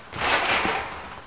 pad

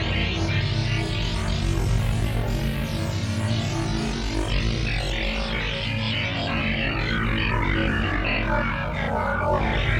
Static Arp; Sonic Foundry; saturn

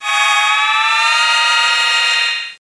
shield up

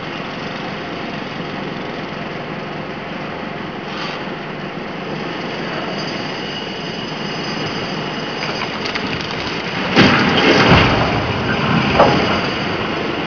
jackhmr, 8bit

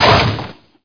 droid hit; Star Wars DroidWorks

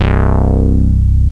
bass; Descent

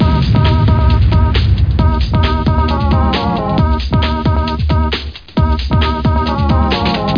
Robot Loop; blender volume1.5 1995

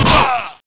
BOSSK DIE; Dark Forces; Operation Stealth

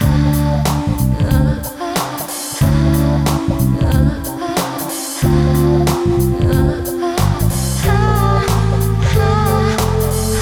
breath

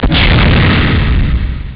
Explosion; PowerPong

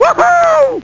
wohoo; speedracerfx

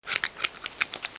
barber

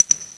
geyser Mined; POOL CHAMP

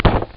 Sound LAND

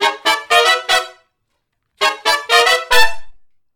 Funk Riff (G), horn; Sonic Foundry; horncraft

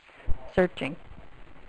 Prospector Search; Imperialism

